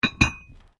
Weights Dumbell Plates Move Loud, Gym weight lifting equipment; Sports